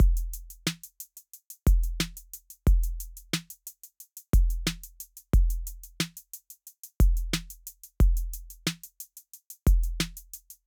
HipHop 90BPM

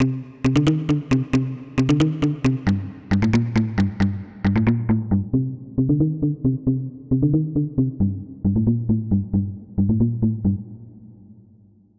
SOUND DESIGN LOW PASS

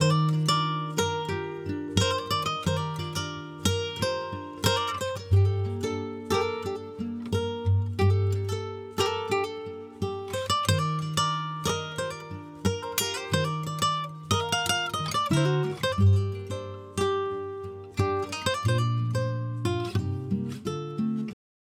GUITAR UNCOMPRESSED

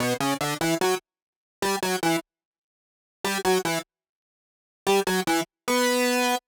Synth Audio

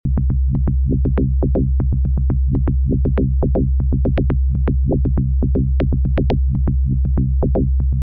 modulation loops

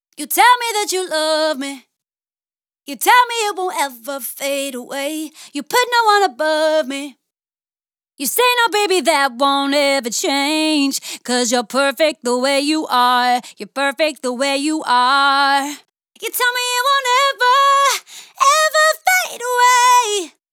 VOCAL HIGH SHELF

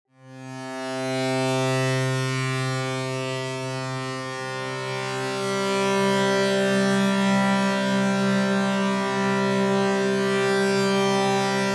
frozen reverb

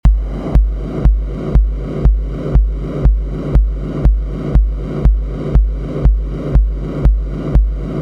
inline sidechain whoosh and kick

